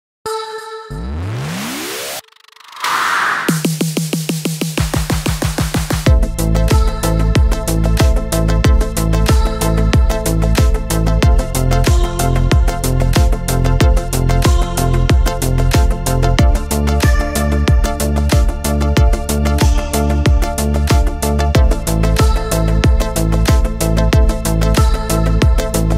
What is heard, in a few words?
edm house trance